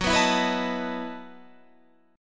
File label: E Major 7th Suspended 2nd Suspended 4th